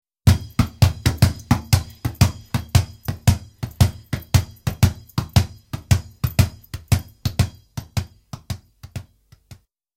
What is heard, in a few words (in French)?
ballon qui rebondit